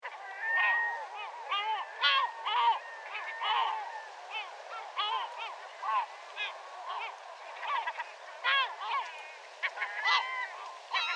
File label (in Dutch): Meeuwen bij de Neeltje Jans